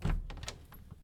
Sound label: door open; Zomboid 41.78.16; Project Zomboid